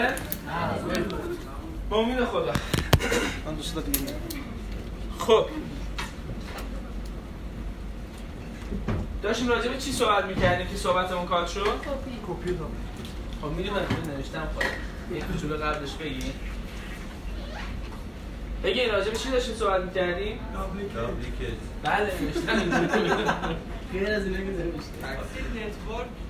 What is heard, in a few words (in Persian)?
صدای قلب